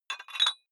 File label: dumbbell put on very small weight hit; sport freizeit; fitnessstudio